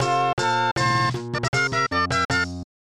Level Clear; Mario and Wario

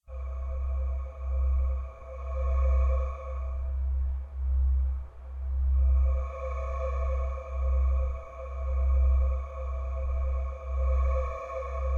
space engine; CRAZY SCI FI AUDIO

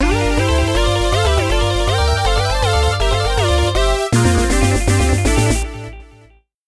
(Jingle) Standby; Excitebike World Rally

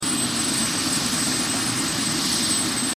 jetpack

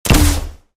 Grenade Launcher Shot

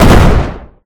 Explosion Fire Gas explosion small no tail; Poppy Playtime; SFX Environment ProSoundCollection v1 3 MONO

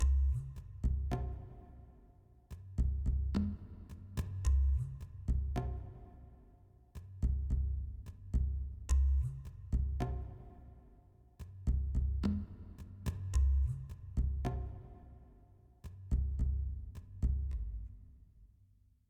Jungle Main Cool Rhythm; Far Cry

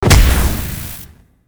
Damage; helltaker

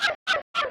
Squeak; Lethal Company

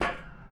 walk metal

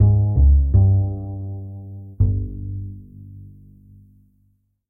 Cabin Stinger

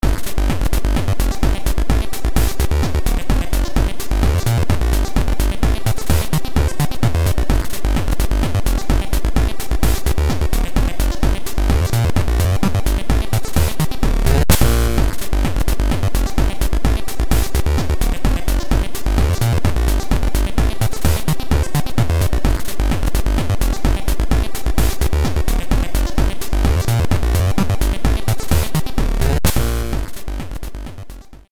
Conversation; Shantae

